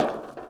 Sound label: bleacher step; Golden Crayon Sounds